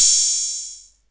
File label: HiHat Open Boss DR110